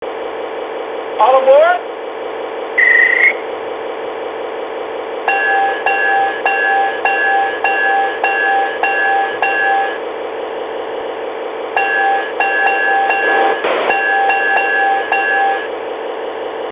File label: diesel sounds